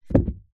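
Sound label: foley wood stick thick medium heavy drop carpet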